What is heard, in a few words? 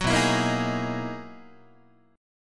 B Major 13th, down, Guitar, BM13 chord; strummed; JGuitar